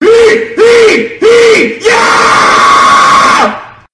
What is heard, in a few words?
heeh heeh yeahhhhh